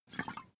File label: hot-water bag glug